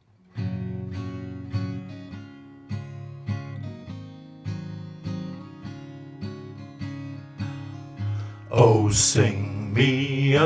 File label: english songs song